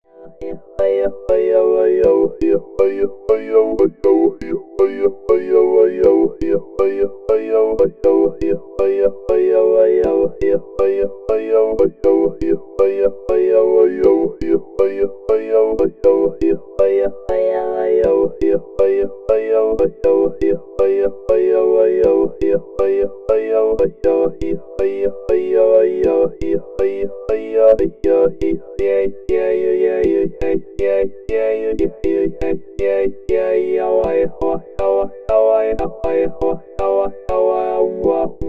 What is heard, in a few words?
AKAI MPC LIVE II Insert Talk Box